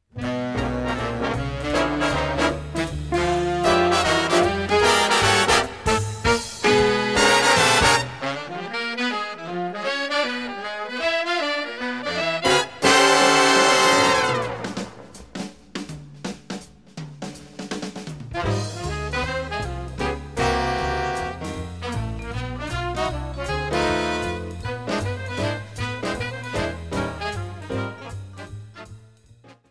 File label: Kicks and Sticks